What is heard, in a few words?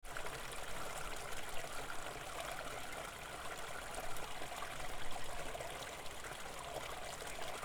creek